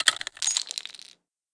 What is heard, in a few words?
rev out, weapons